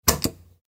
壁のスイッチ

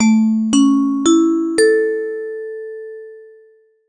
お知らせ 始まり 遅め; 戏剧音效

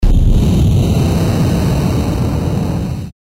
炎魔法 大 モノラル; 魔法効果音

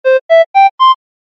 場面切り替え 笛風

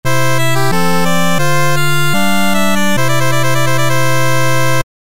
教会 祈る レトロ

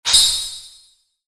ランク レア度1 モノラル

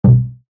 擲弾発射; 武器効果音

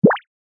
ぴょこ

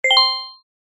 場面切り替え ベル

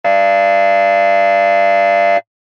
劇場やホールの開演ブザー 短め リバーブ無し; 演劇・舞台

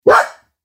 犬の鳴き声

